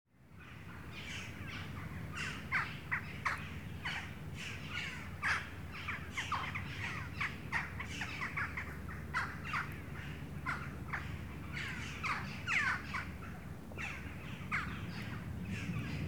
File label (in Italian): Taccola Coloeus monedula; Caterina Trifoglio; Xcanto